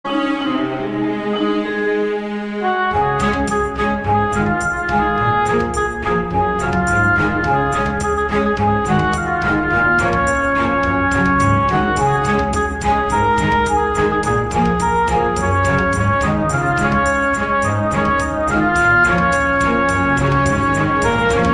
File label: Orchestral Remix